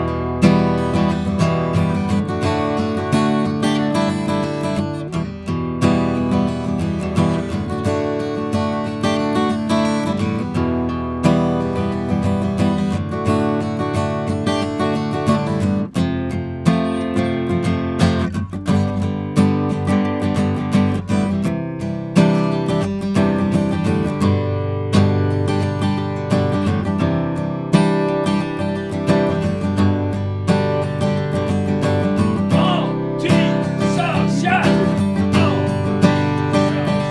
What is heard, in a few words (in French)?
guit comp; pendulum; quartet